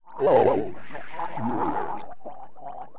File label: DROWN; voodoo